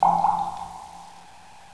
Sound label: drip